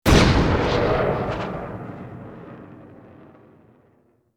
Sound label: Bullet Time; FXHome; Bullet and Gun